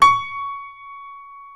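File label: piano C#5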